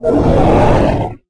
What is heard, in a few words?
Groan; Barotraumatic; CthulhuintermediateWild